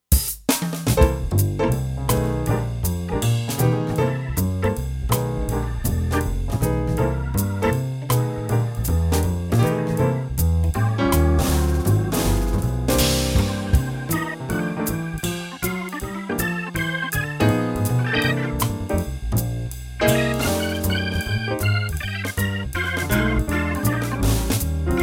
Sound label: blues